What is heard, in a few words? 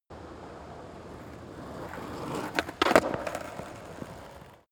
skateboard single drive fall off short; sport freizeit